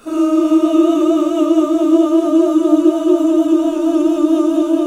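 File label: Gospel; Best Service Gigapack 1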